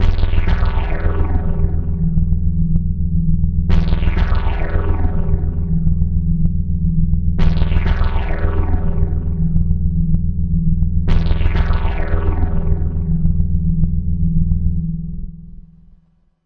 disturbing big bass hits; Basses

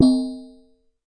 Processed Ethn Perc, ETHNIC and WORLD PERCUSSION

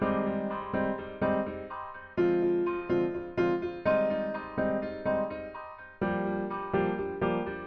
125 echoing jazz chords; KEYS ORGAN PIANO LOOPS

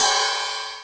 80s CRASH, 80s Drum Machine; DRUM MACHINES

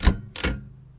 keypress, Typewriter Kit

